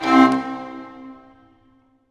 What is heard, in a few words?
music puzzle note up